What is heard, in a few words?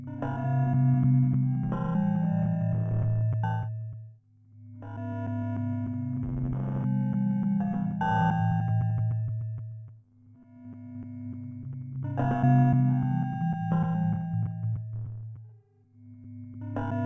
Ambient Pads